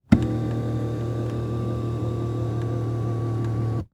Transportation Car Monte Carlo Adjust Seat

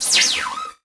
Multimedia Internet CD-Rom Flash Whoosh

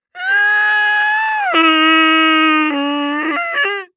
Cartoon Human Animal Howl

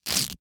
Foley Velcro Unhook Quick

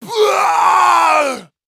Horror Scary Human Male Caveman Scream

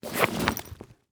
Household Bag Pick Up Duffle

